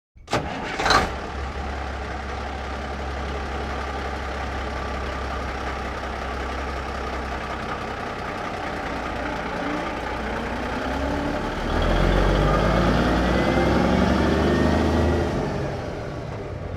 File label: 1954 Big Rig Ext Start Away; Period Vehicles